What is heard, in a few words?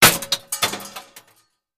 Bullet Impacts Metal